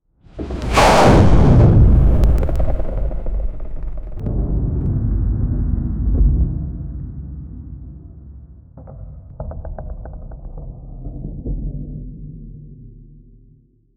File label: deep bone cracking transf; armor